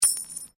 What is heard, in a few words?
Coin Penny Single Drop Stone